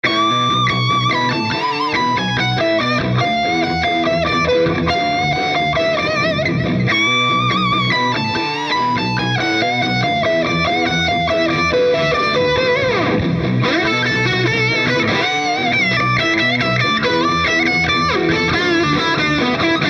Blackstar Artisan 100

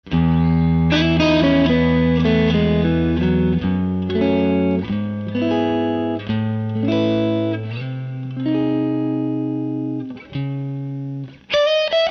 Wampler Ecstasy

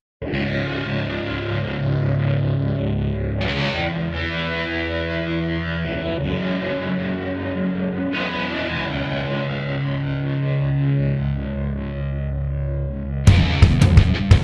Hot Rod; PRX150-Pro Power Attenuator

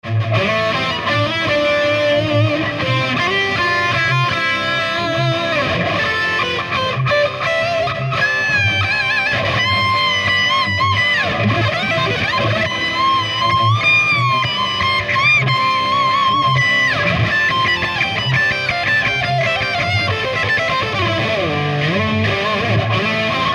Fryette Pittbull 100CL

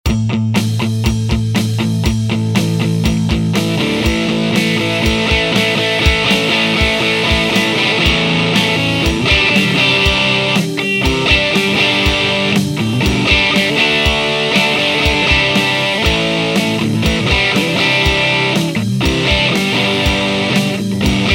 vrx18 drive

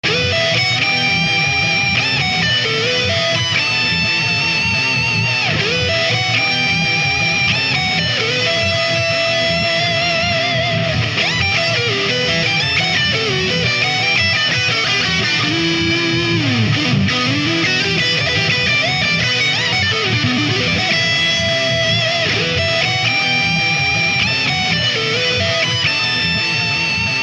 Marshall TSL100 Crunch Channel Dimed

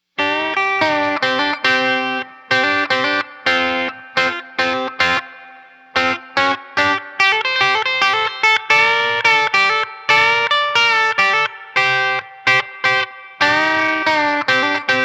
G&L S500 CPR Super C

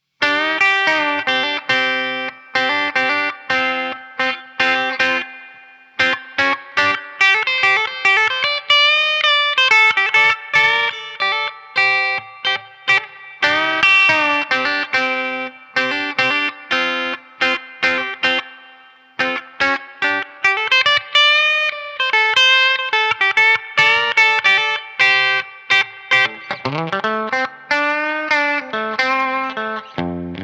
G&L S500; CPR Super B

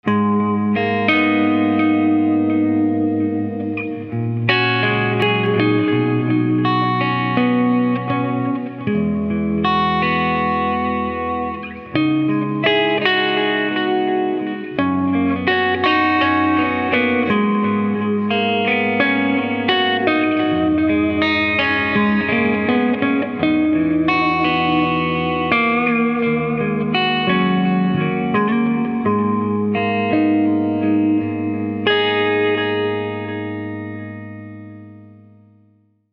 Fryette Pittbull 100CL Les Paul Lower Gain Red

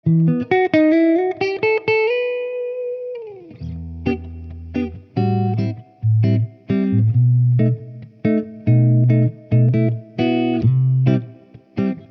Marshall TSL100 Schecter Jazz

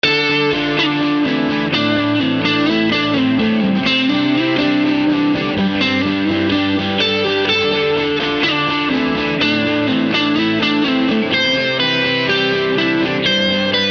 Distorted; Wampler Ecstasy

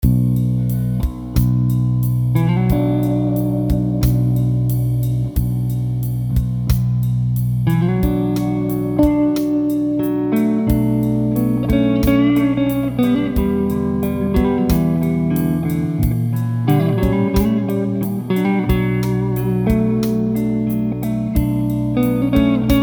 bari strat roxbox

